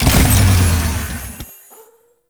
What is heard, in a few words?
Motorcycle, Boost; Warframe; Vehicles; Motorcycle Ninety Nine